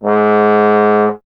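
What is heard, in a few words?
bass trombone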